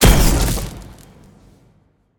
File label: ground slam; smite; npc; grkconqs8 titan chaos; s8chaostitan